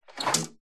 household living room lounge horizontal wooden blinds open